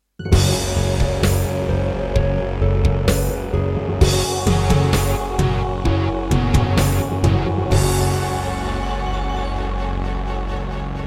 Roland SC-88 Pro 88MAP; Doom (Sigil); Introduction

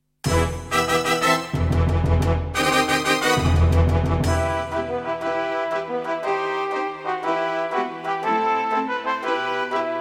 EMU SoundEngine; Warcraft II

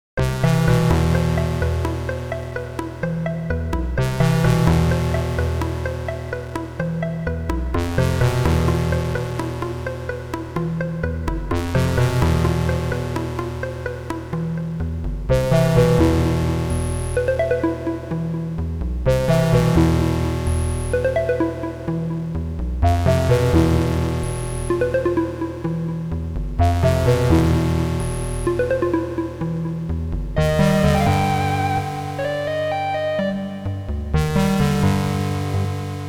Roland CM-500

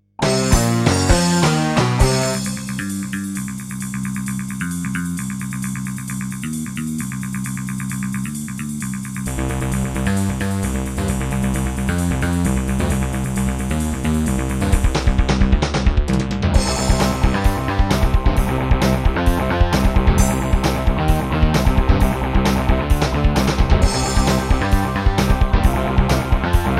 Creative Wave Blaster; sigil doom episode 5

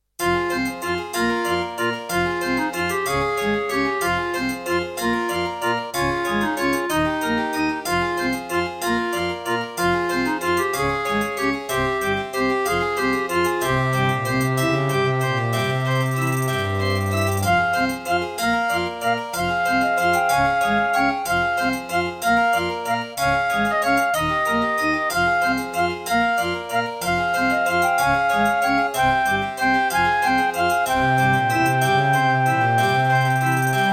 Track 6, Roland PMA-5; Death Gate

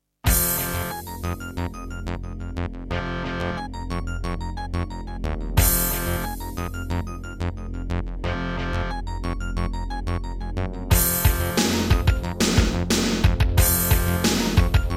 Terratec MiniWaveSystem TTWT; sigil doom episode 5

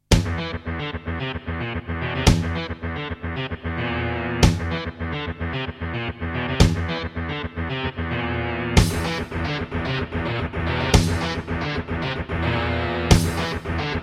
Terratec Wave XTable; Doom

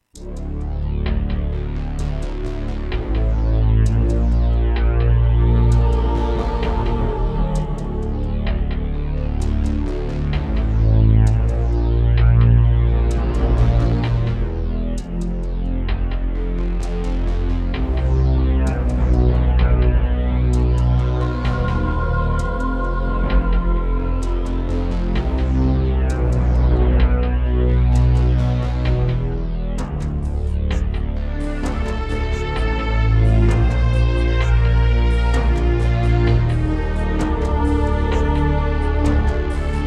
Roland SCB-55; Descent II

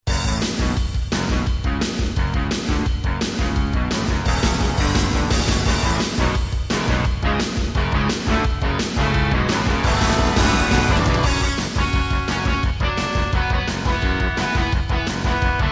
Roland SC-8820; Duke Nukem 3D

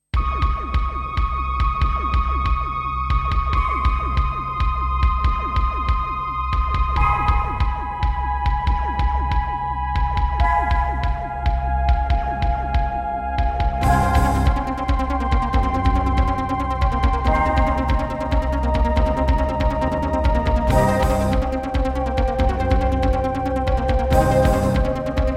Doom, Turtle Beach Rio; sigil doom episode 5

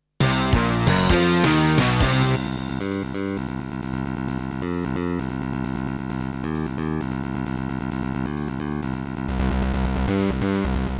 Roland SC-8820 55MAP; sigil doom episode 5

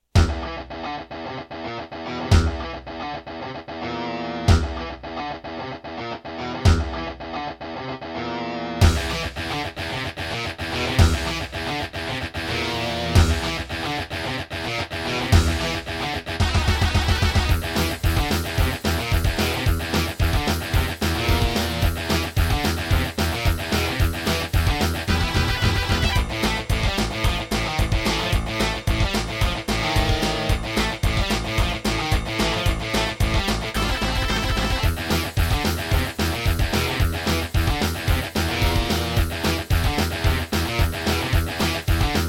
Serdaco Dreamblaster X2GS BURAN V1.1; Doom

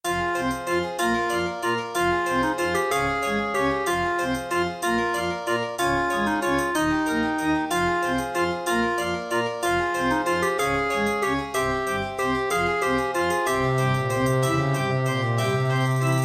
Track 6, Roland SC-88ST Pro 55 MAP; Death Gate

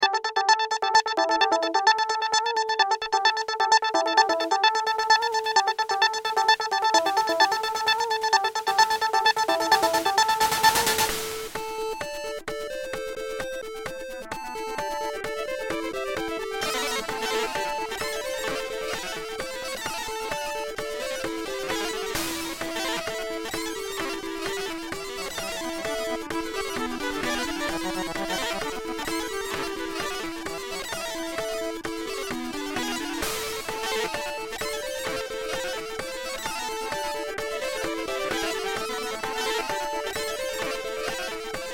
Creative SB Pro2; System Shock

